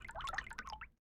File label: simple ripple up, ui; Galaxy Note 9 Ringtones